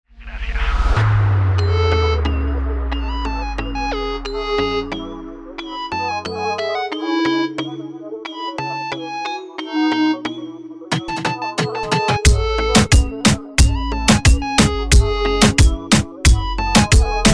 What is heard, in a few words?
Reggaeton; Capi Beats Reggaeton Essencial Vol.3